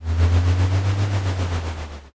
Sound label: growl